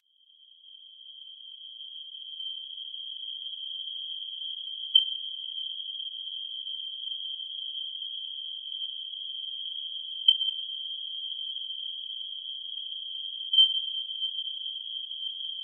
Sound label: echosounder 4khz; Sundahöfn; Fimmtudaginn 30. desember 2010 dýfði ég hljóðnemum í sjóinn í Sundahöfn